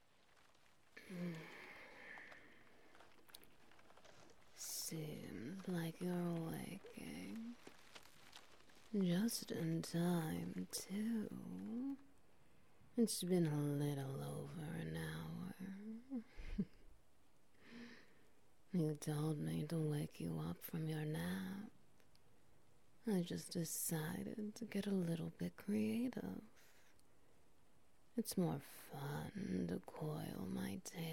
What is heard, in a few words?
Ambience Wet Noises